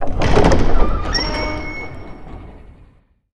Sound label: Door Large Wood Open